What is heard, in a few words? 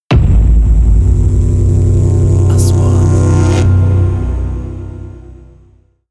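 3D SFX Trailer; مؤثرات تشويقية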